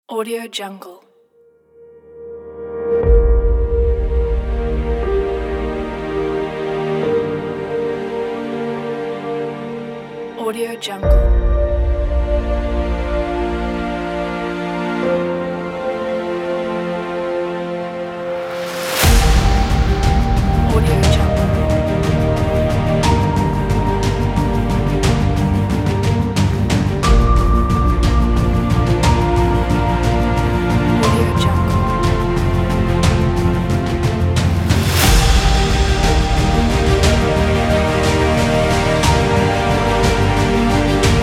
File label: cinematic epic heroic